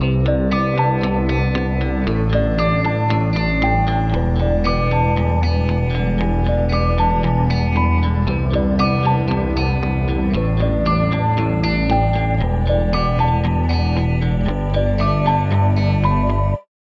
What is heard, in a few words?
guitar